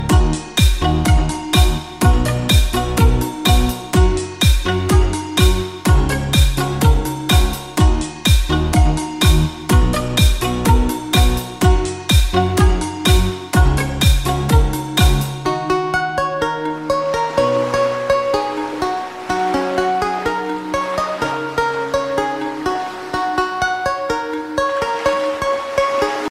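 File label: ringtone Remix Tiktok instrumental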